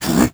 hm; Crypt of the NecroDancer

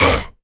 deathmetal hit; Crypt of the NecroDancer

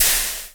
coals step; Crypt of the NecroDancer

